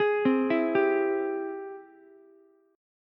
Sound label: Ab Augmented Major 7th Ab Ukulele; arpeggiated; 13,12,12,10; Ab+M7; JGuitar